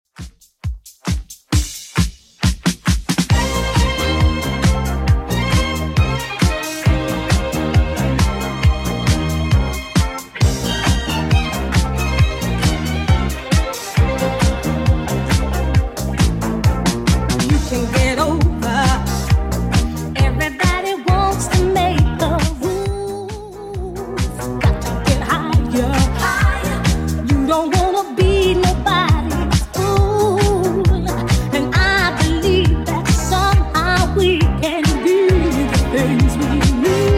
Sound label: 80s Redrum 135bpm